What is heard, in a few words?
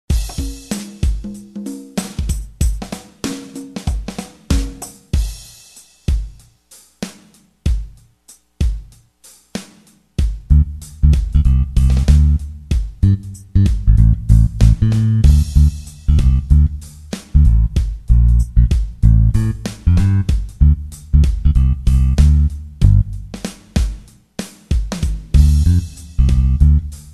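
smooth jazz drums bass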